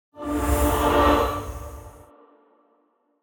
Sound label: Scary transition halloween suspense